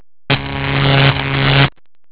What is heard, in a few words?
end level; pang